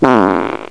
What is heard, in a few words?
fart